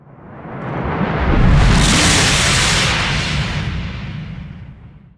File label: Lightning Strike Activate Start; Evolve; Kraken